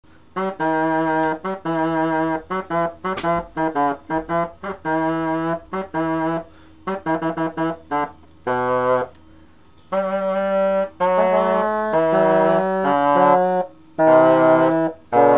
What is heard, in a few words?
tenor shawm